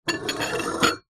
household wine glasses x3 slide together on wooden surface